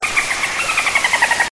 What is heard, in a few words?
dolphin appears; pvz; animals